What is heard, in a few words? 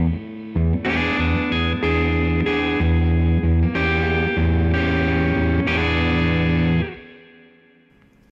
noise